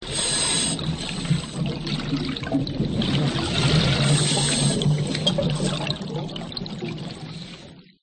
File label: Underwater Diver; Quicksilver